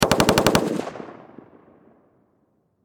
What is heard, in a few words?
Warfare Machine Gun M16 Short Burst Distant Perspective Farther Away; Machine Gun Sound Effects; SFX Bible 6